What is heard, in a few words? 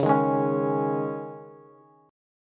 Db Suspended 2nd, Bass; strummed